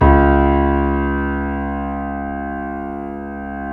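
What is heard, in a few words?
keys, YC7 piano pp; Roland L-CD701; pp YC7 St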